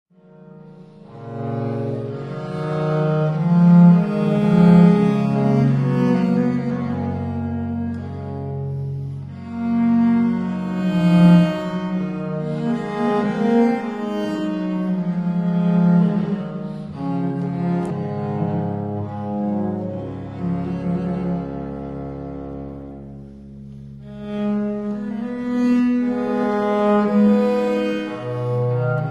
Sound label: for 2 instruments in G major, Sarabande